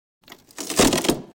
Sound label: Wooden Crate is Ripped Open; Fights Bodyfalls